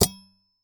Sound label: Weapon Metal Bow And Arrow Metal Thump; AdobeCC